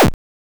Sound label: Bomb Explosion; bullet party